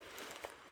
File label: generic cloth movement, weapons handling